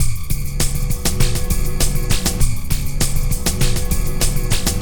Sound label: ATMOSPHERIC ELECTRO BEAT, PLASTIC ELECTRO GROOVES